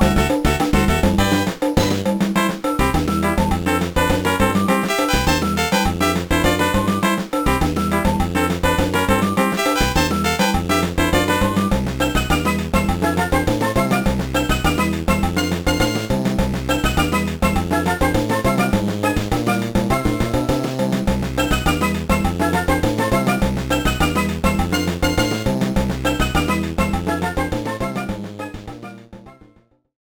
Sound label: Theme, Ground Theme; SMA2; SMB